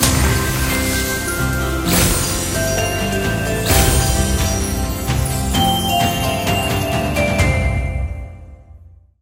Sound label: megawin msx sfx; Great Book Of Magic Deluxe; Wazdan